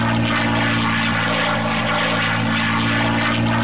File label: dist, spitfire; lfs